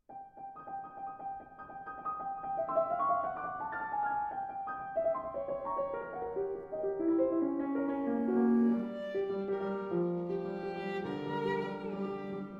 Sonata For Violin and Piano in G Minor, Finale

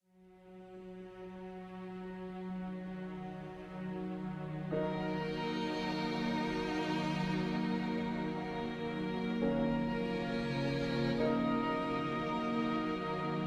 Lullaby

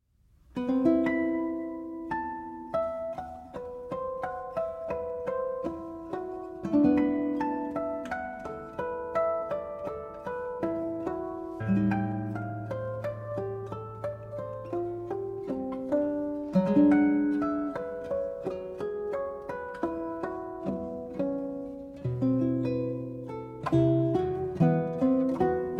Suite I. Movement (Arr. For Guitar)

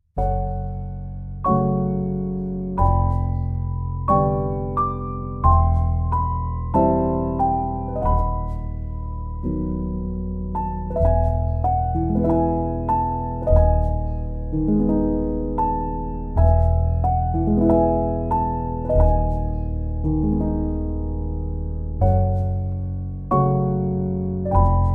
Solo Piano Version

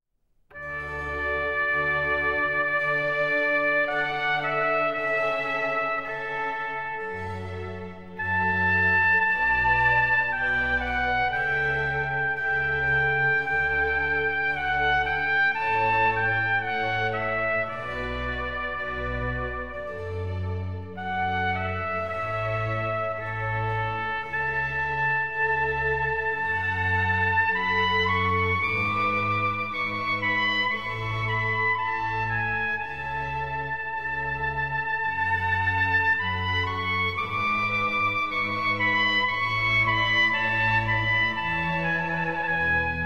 Trumpet Concerto in D Major, Adagio; Telemann

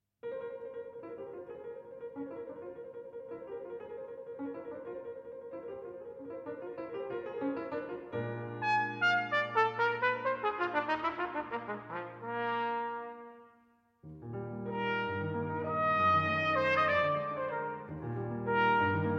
Trumpet & Piano; II. Allegro

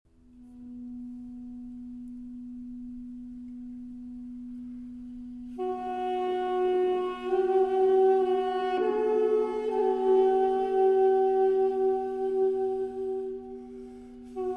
Plainte, Duo Duduk - Flûtes Arméniennes, Armenia

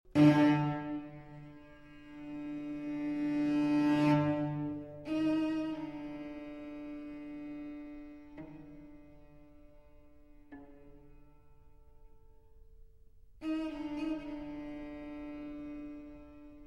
String Quartet, Con dolore

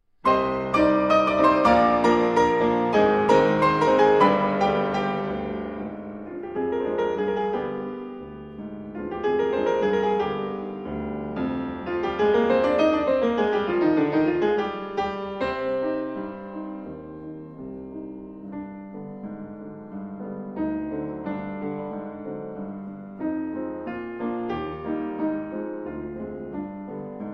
Pasodoble, for piano

